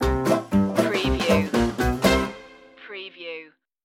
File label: clown-o-rama stinger